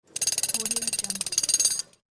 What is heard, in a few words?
road drill